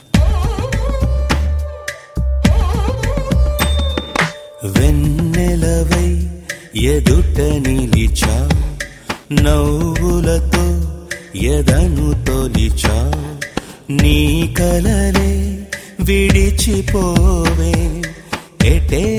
Male Version

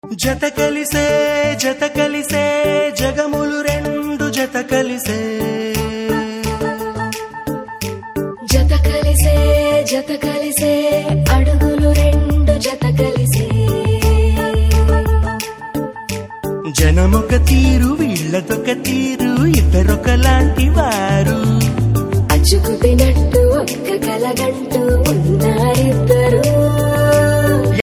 Ringtone; Srimantudu

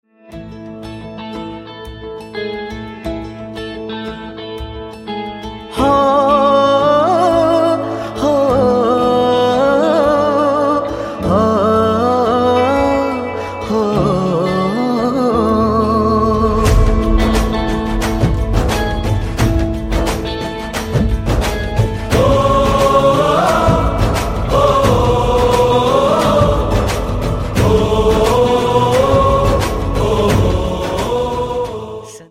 BG Chorus Ringtone

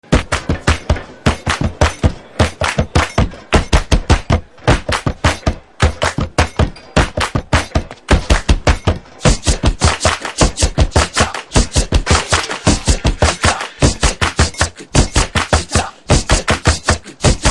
Drum Beats Ringtone

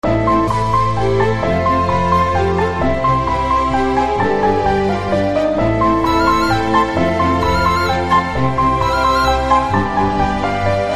Ringtone

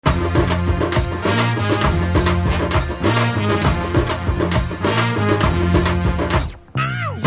BGM Ringtone

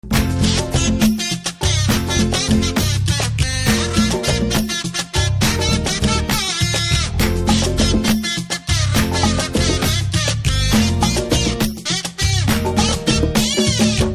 BGM Clarinet Ringtone; Republic

